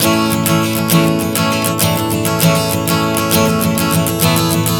Strum 100 A, One Chord Strumming 100 bpm; Acoustic Guitar

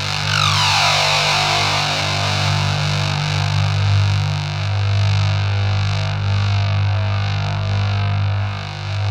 ZWEEP; Spectrasonic Distorted Reality 1

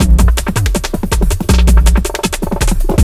JUNGL 160, JUNGLE LOOP

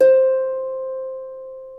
C4 CELTIC, HARP; CELT.HARP

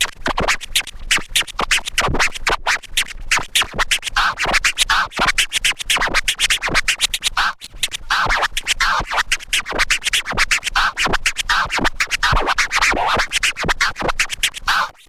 SCRATCH SEQUENCES; SCRACTH; Megabass Remix! 2

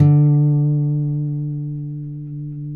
Finger D 3 R, Guitars; Spectrasonics; Hans Zimmer Guitars VOL-1

